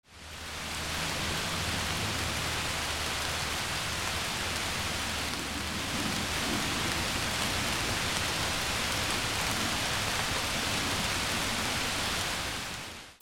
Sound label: RAIN